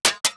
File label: metal snare mini